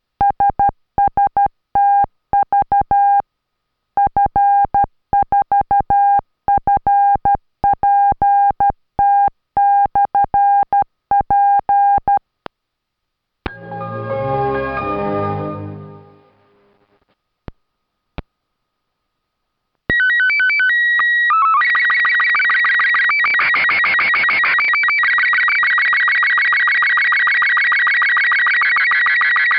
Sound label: CW WAV SSTV